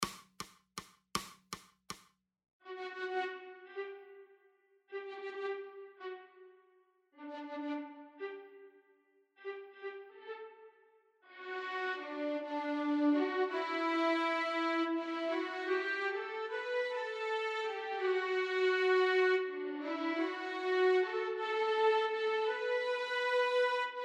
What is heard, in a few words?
wurligig waltz